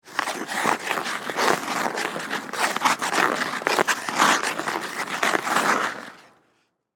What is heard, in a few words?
Ice Skates Twirl, In, Circle; Sports; Ice Skating